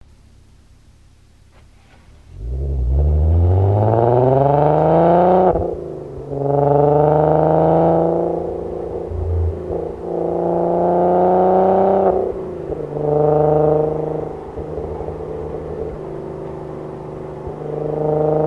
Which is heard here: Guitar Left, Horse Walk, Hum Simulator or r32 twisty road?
r32 twisty road